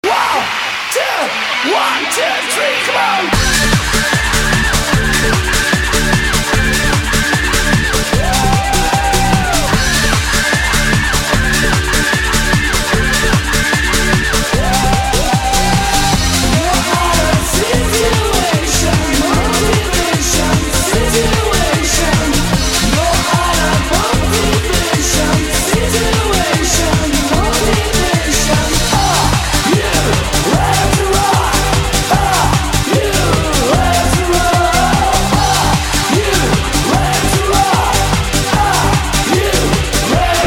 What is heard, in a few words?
ROCK BEAT; DDR 3rd Mix